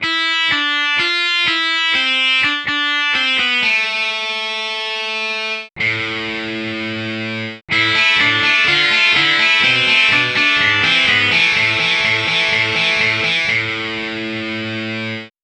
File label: overdrive guitar samples